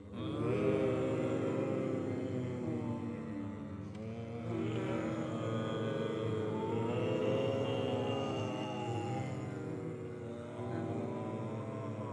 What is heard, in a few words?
Horror Scary Crowd Zombies